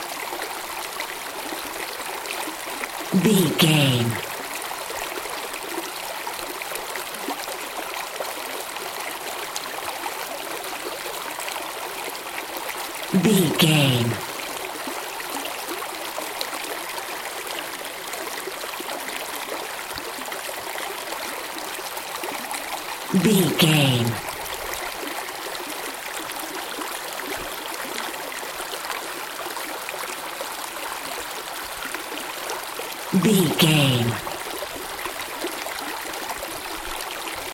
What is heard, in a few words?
river stream flowing water loop